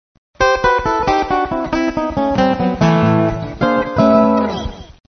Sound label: acoustic blues, guitars